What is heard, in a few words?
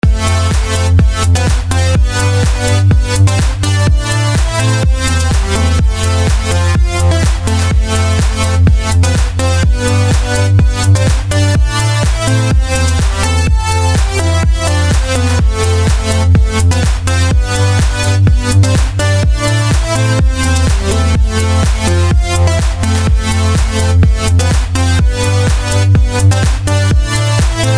house